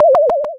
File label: Cry